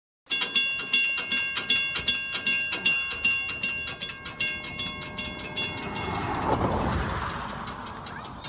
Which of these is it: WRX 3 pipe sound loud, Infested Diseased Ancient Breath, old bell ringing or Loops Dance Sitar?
old bell ringing